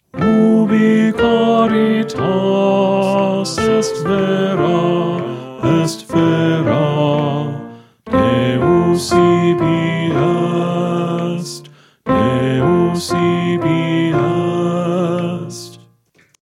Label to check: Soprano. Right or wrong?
Right